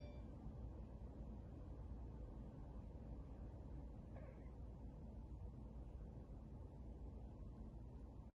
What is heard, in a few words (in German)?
Katzen am Fenster